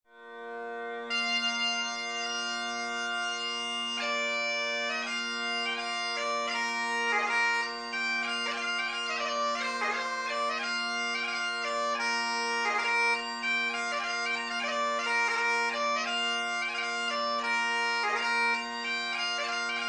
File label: Highland Pipe